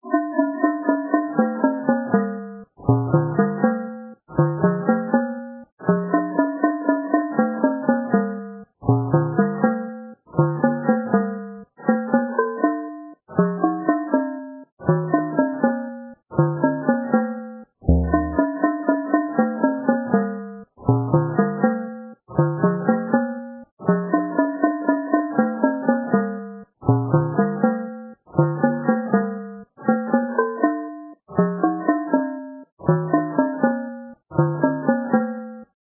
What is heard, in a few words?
elise tubes